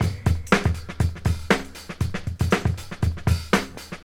119 bpm modern drum loop sample g key, Free breakbeat - kick tuned to the G note.